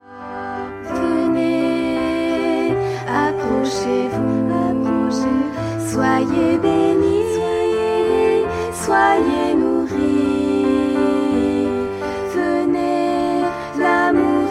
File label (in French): 3 Voix Piano; Venez Approchez Vous; Chants De Communion